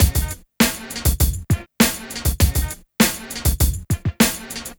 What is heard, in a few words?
Street Tip, R&B Drums